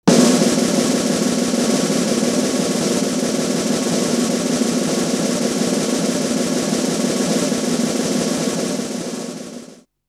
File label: Drum Roll